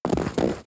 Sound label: Yeti Run